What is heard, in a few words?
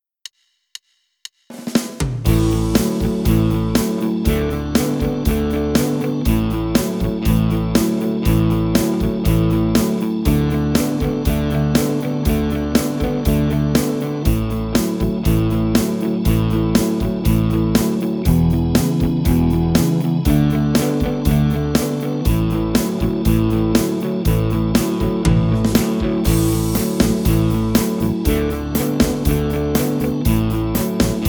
Exercise 2 Open String Blues; Guitar 1